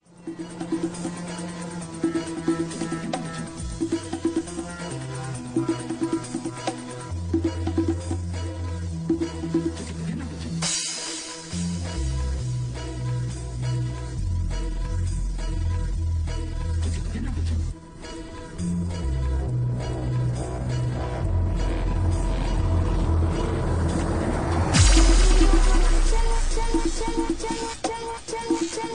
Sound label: Eastern Bass